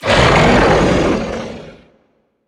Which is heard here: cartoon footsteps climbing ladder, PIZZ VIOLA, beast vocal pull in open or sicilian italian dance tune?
beast vocal pull in open